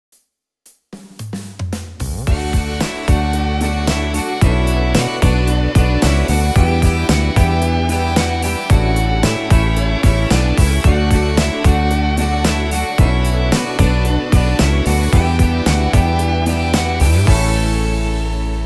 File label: Tin Pan Alley